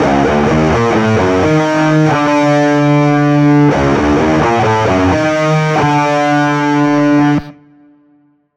Rock Guitar, This example is the same as example #05 but the brass from example #03 above is added for an even larger single guitar sound.; Zystrix